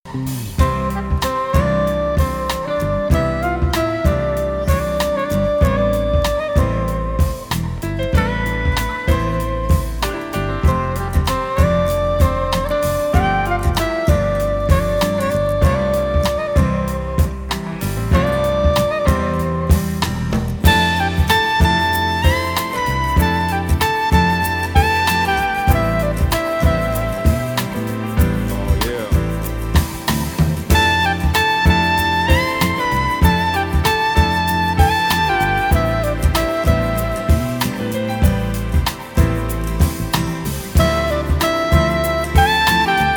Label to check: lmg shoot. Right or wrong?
Wrong